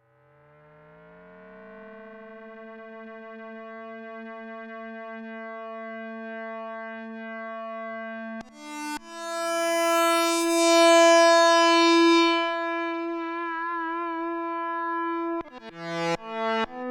Soft Ring Modulation, synthesizer

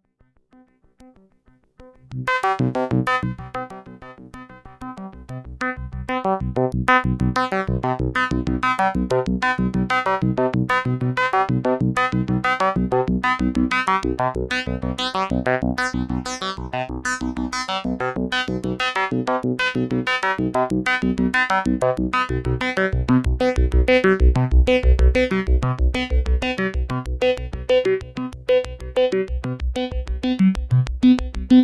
syrinx filter sequ

